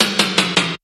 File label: Fill 160BPM